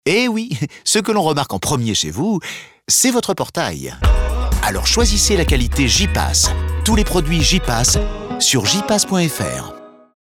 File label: SPOT MUSIQUE